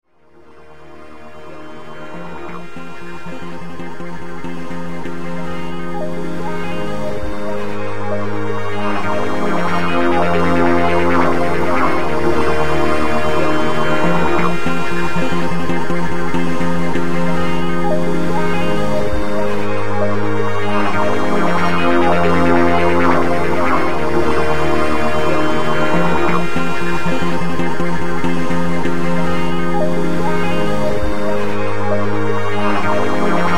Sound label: loop